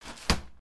umbrella open

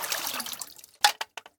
threatened lion

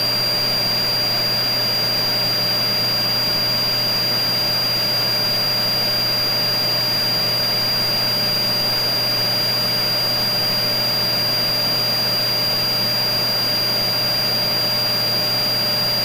vacuum cleaner operation

